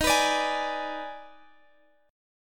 D sharp Major 7th Suspended 4th Sharp 5th D sharp Guitar Drop D, chord; x,x,13,16,15,16; chordshape